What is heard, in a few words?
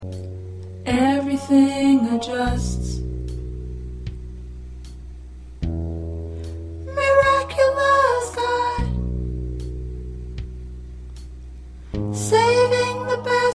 Soprano